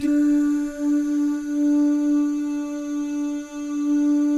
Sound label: DOO, jazz FX, mix guy gal; Spectrasonics; Vocal Planet